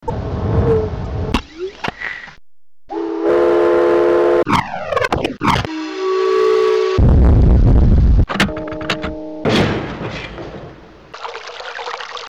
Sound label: slider sounds; Myst